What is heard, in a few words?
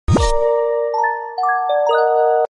refreshing short message tone ringtone, sms ringtones; iphone sms ringtones